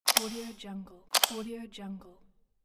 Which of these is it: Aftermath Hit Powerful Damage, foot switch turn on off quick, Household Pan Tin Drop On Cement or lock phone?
lock phone